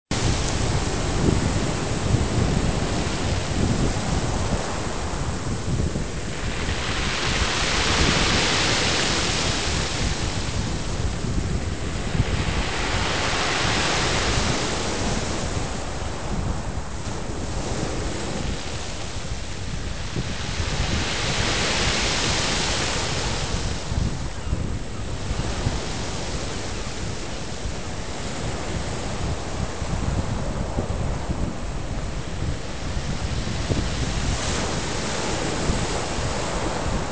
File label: waves